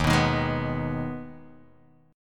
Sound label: D sharp Suspended 4th Sharp 5th, Guitar, Drop D; chord shape